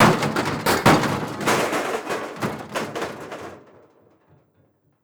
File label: metal gib; Rust